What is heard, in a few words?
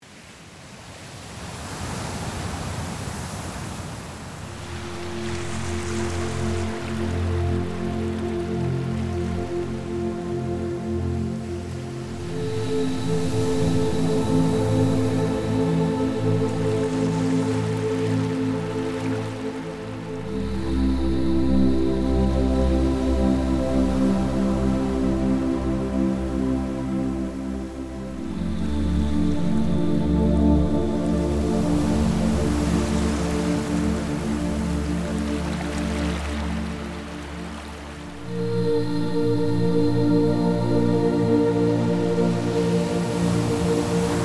Music for Ketamin Session 30 Bpm With Ocean Waves